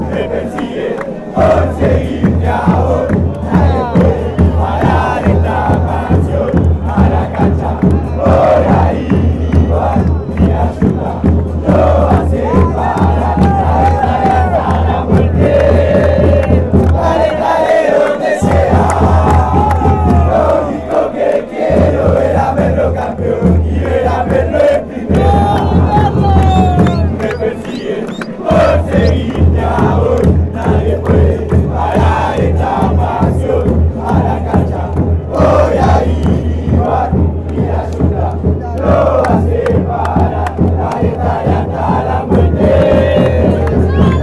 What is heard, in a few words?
deportivo merlo chant